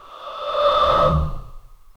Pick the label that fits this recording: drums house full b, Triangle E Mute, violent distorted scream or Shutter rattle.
violent distorted scream